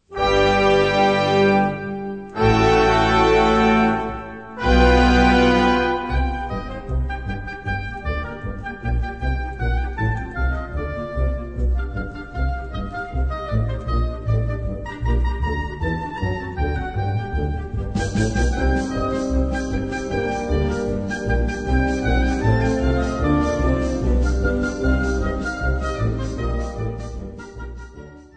Three Russian Folk Songs

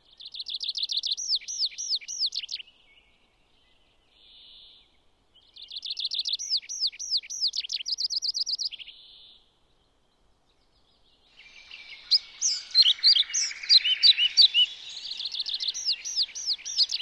Bird Sounds; Pini Di Roma Part3

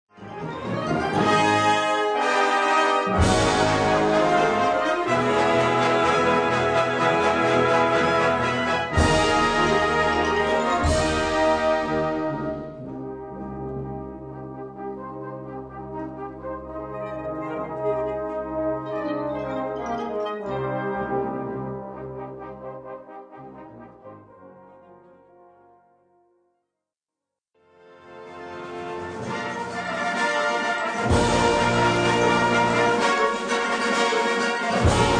Ceremonial Fanfare

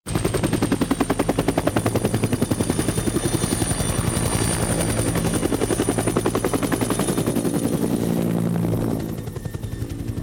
Helicopter

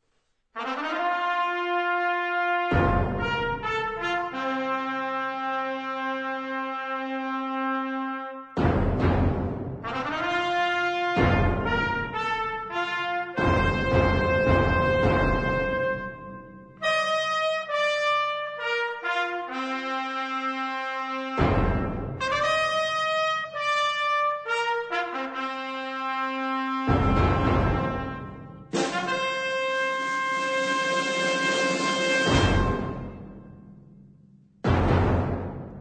Fanfarria

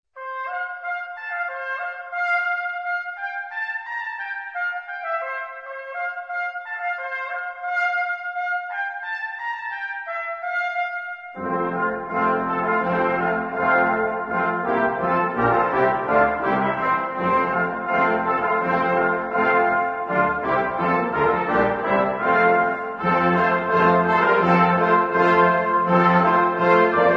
Christmas Carols

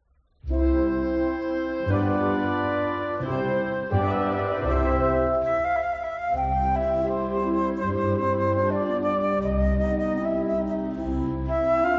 Andante For Flute And Windband

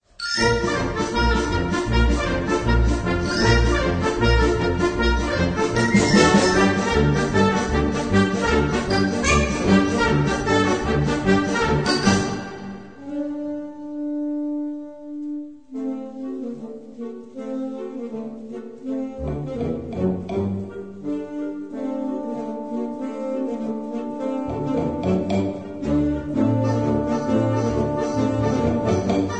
Three Russian Folk Songs

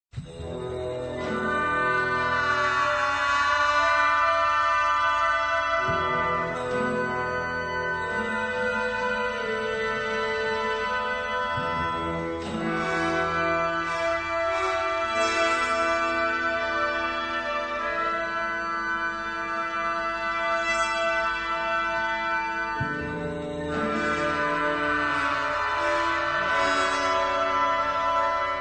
Japanese Tune

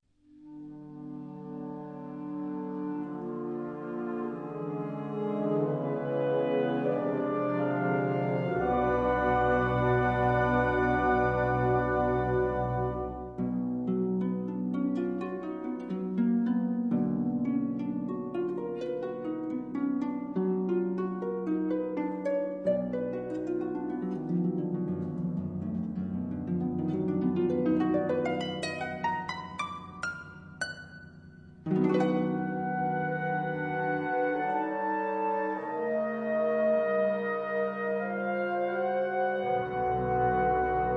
Concerto pour Harpe

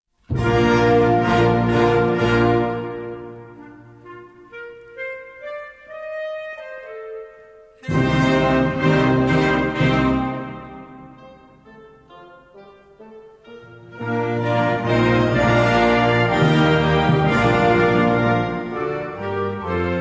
Capriccio for Trombone

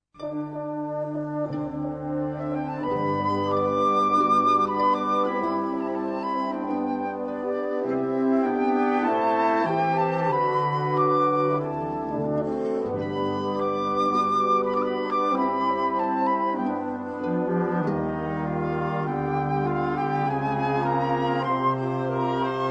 Divertimento For Flute

